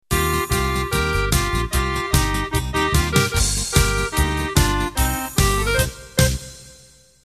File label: Polka Bavarian end